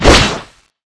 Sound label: sword bombard slash